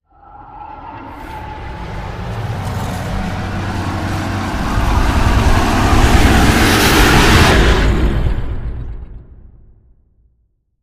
Fight Rise; Godzilla

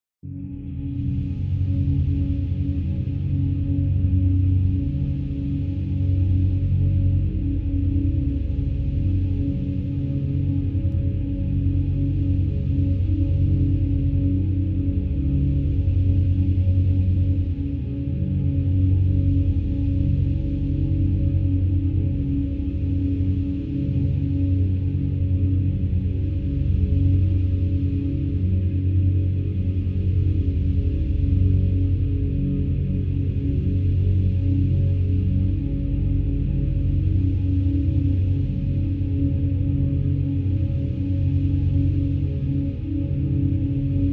Low Drone Only

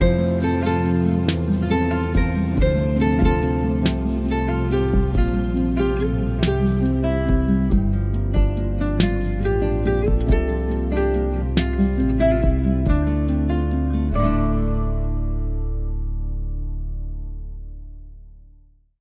Waltz

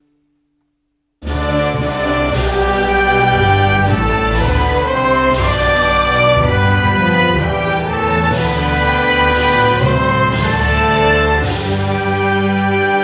Serious United States National Anthem, Sting

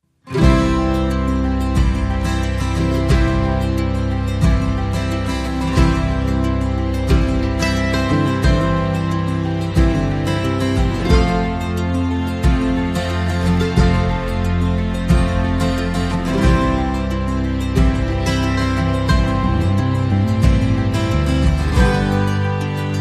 No Mandolin Solo

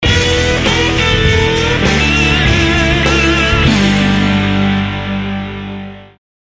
Sting

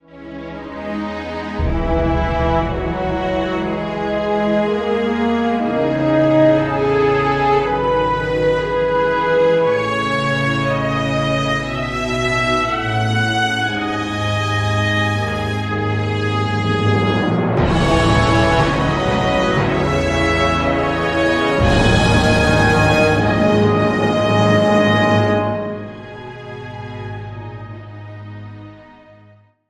Desolation, No Choir

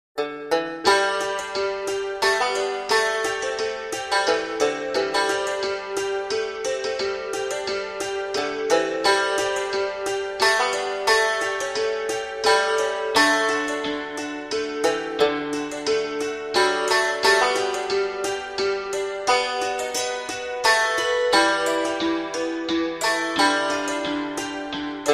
Hammered Dulcimer & Banjo Duet

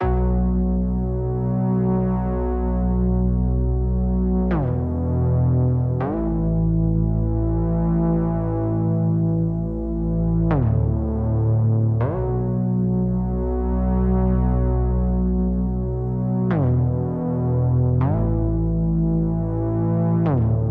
Synth

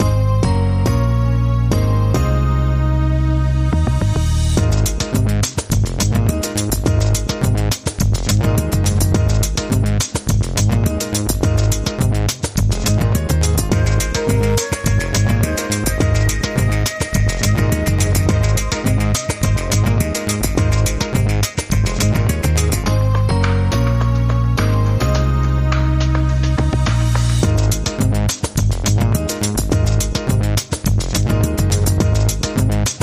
Globe Watch Promo

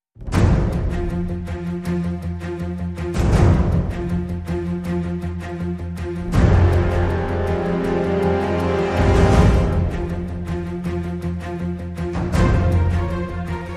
No Choir

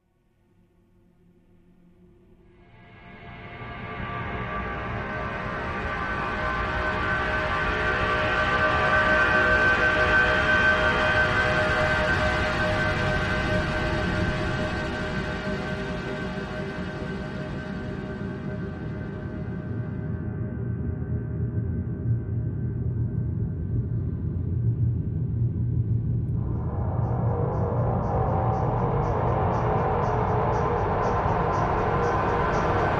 Drones Only

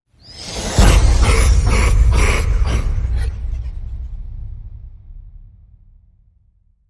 Slow Evil Laugh

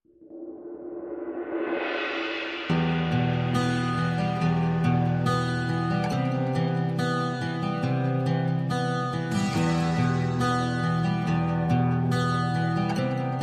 Ambient Mix

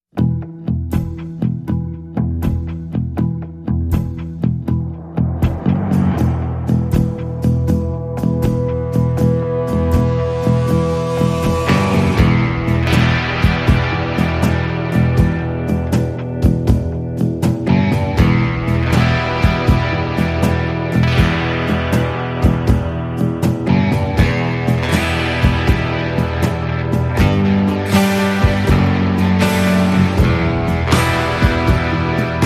No Guitar Solo

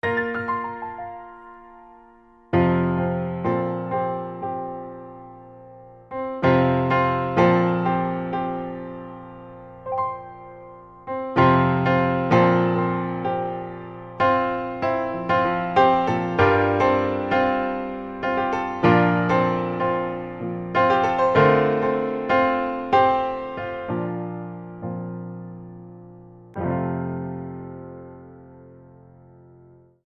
Introspective Piano Etude

